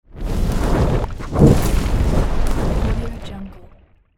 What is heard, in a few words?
fire reveal; transitions and movement; صدای آتش